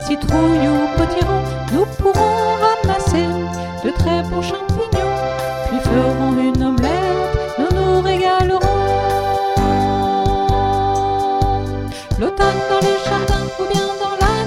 CHANT

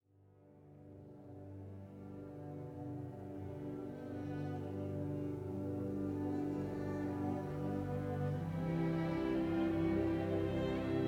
Murmures de la forêt oiseaux; Richard Wagner

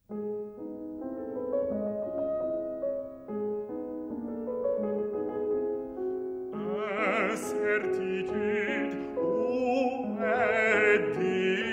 L'écrevisse; Francis Poulenc

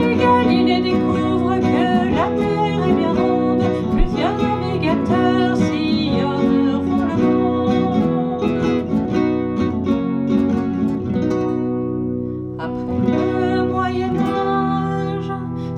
Extrait chant, Arts et découvertes de la renaissance

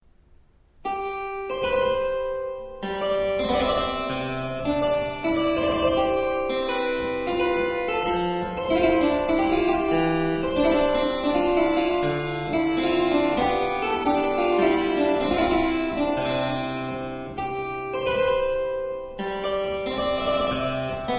Rossignol en amour; Couperin

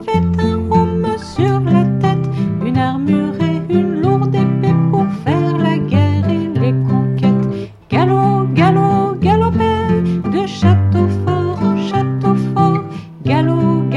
extrait chant

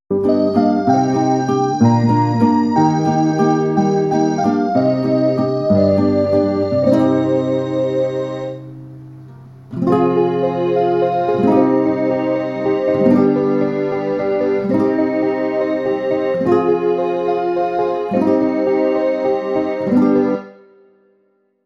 INSTRUMENTAL

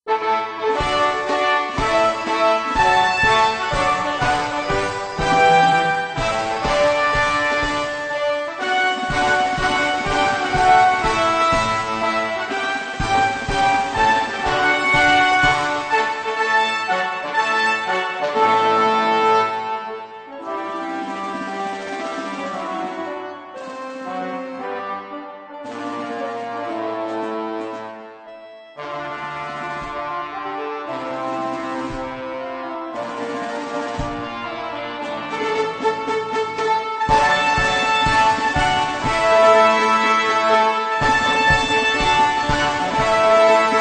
Marseillaise instrumental

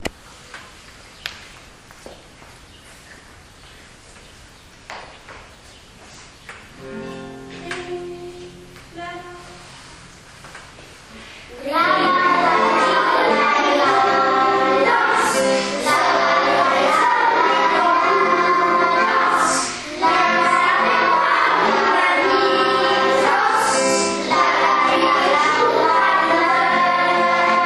avec ostinato; Samba lélé

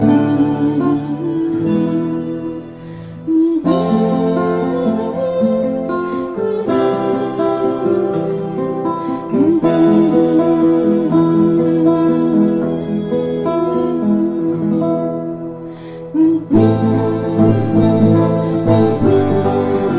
Des champignons dansaient instrumental

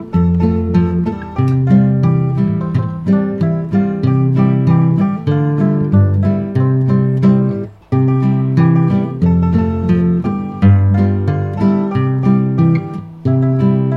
extrait instrumental

